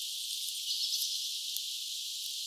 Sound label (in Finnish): mikä ihmeen rastas kuin laulusäkeen osa tai jotain onko ehkäpä sinihohtorastas